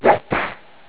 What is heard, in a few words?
whip